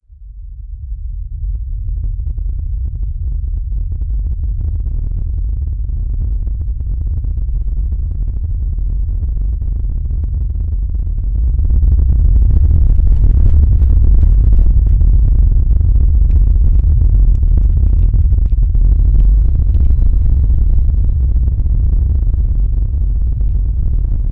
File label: rhumble; hl2 ep2; ambient, levels, launch